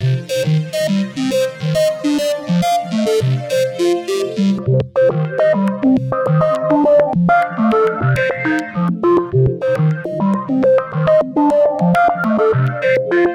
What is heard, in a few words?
Waldorf 2 Pole Synth Sequencer Bubbles